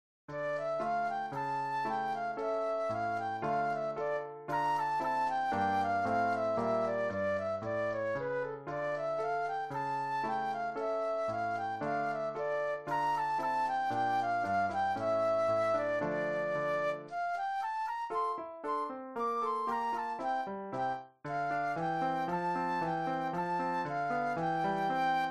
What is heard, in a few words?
Gavotte Flute Solo; classical; Bach; BWV 822